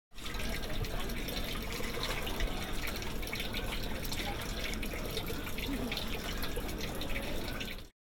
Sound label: Drain near fishop, whitstable